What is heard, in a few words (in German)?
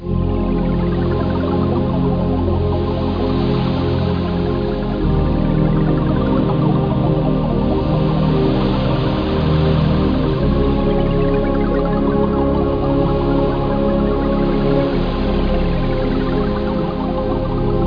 MUSI